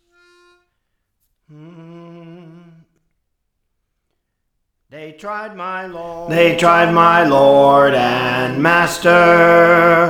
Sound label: Bass; Praise For The Lord Training